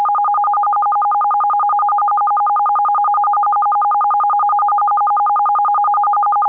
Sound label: FEC-A; FEC-A-144 idling with RQs